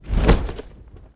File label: airdrop chute